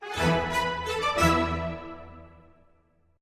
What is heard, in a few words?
music cars race finish sting; lightning speed